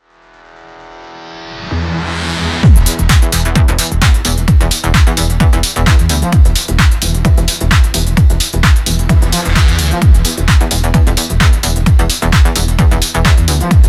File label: DRILL